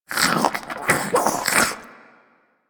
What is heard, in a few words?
Infested Close Goop Release; Ambience; Warframe; Infested Eris